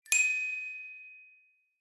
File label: fantasy magic wand ping bright; zapsplat